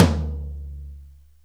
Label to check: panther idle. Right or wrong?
Wrong